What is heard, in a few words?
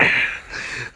gurp; openffa; blade